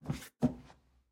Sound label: Footstep Lab Rotate